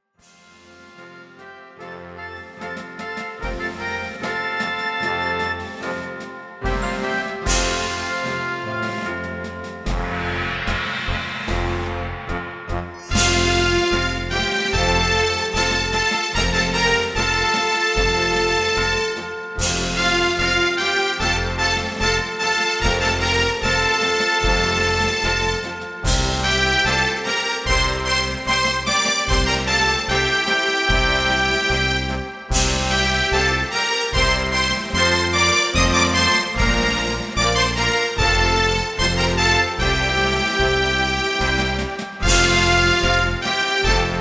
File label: drummer